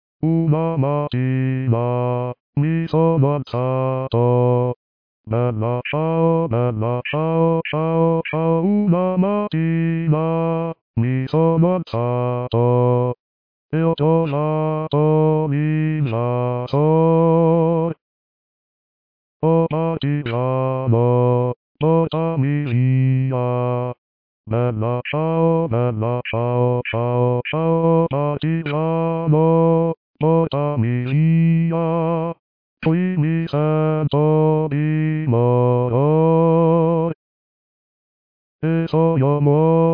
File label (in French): Basse; Bella Ciao